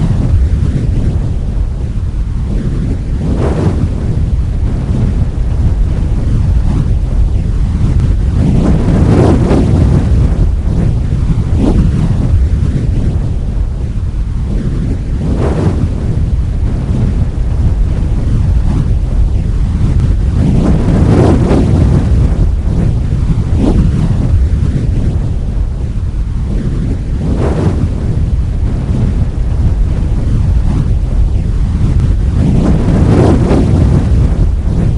wind slowed

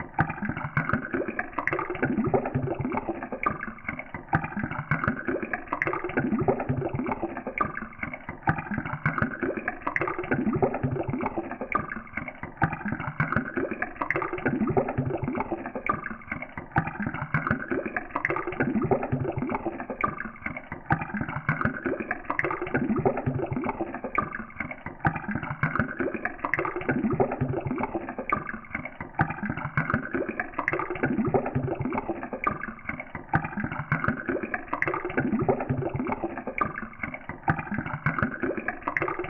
bubbles spedup

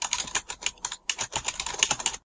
Key type

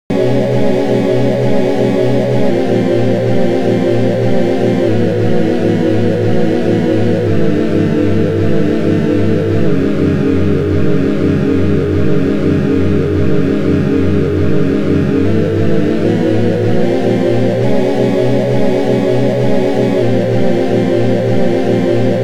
Music

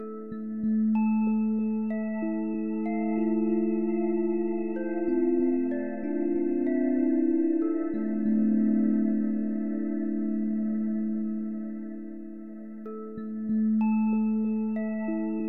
ocean slowed 70

